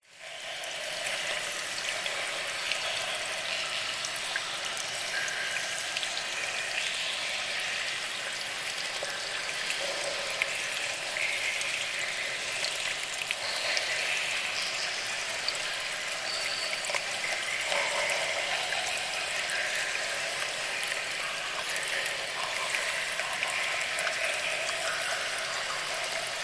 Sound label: nature cave ambience water running dripping